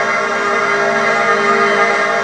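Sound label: G#4 air vox; Northstar - The Wizard VOL-1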